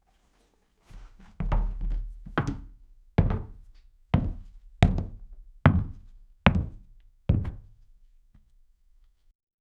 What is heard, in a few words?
heavy boots for a giant